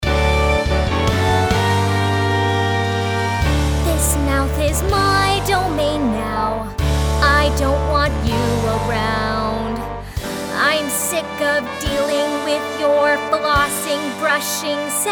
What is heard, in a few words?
vocal